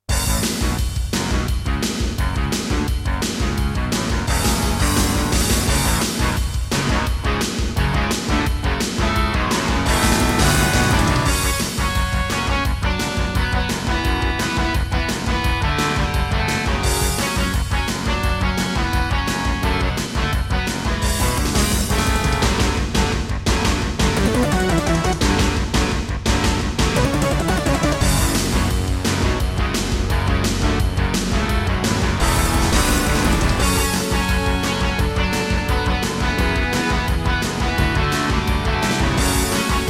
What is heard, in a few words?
Intro, Roland SC-88 55 MAP; Duke Nukem 3D